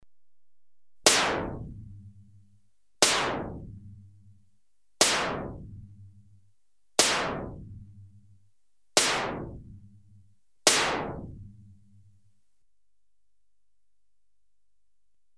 Compressed gas gun (6 single shots), Electronically Generated Sounds; Sound Effects Library